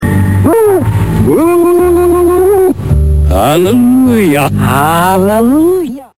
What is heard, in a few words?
HALLELUJ